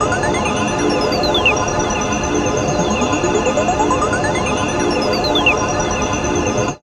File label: odd, Weird Loops, Chillout; Best Service ProSamples vol.36 - Chillout